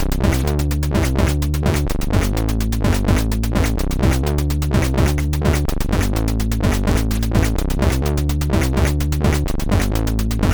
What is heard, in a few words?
Amiga Noid Intro sid MOS6581R4